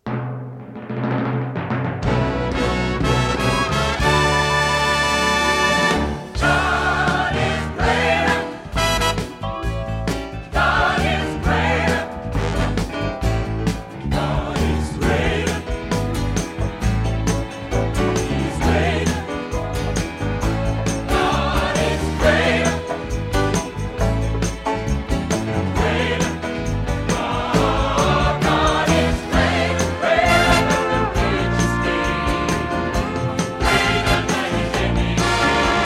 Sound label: Stereo Band & Vocal Mix